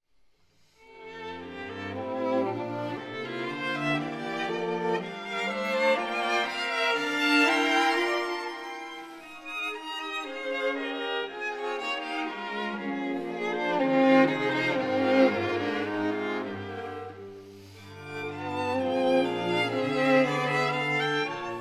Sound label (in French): Quatuor cordes fa maj; Ravel Maurice; Op 35 1er mvt; Quatuor Amicis